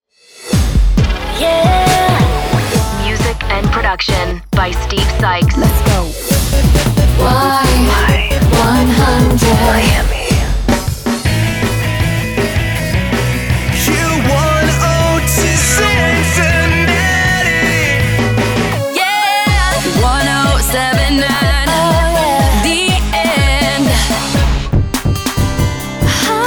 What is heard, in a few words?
JINGLE